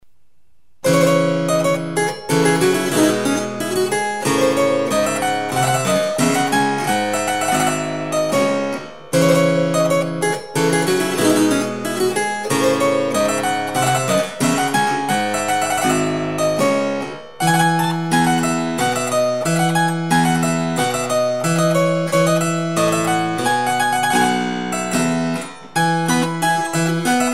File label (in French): anglaise